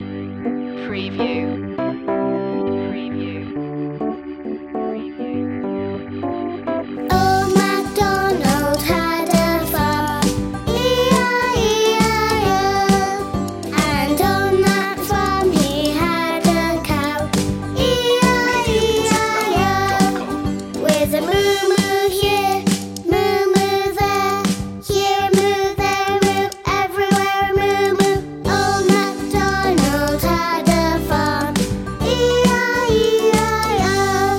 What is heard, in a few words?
old macdonald had a farm children vocals